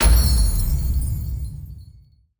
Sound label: success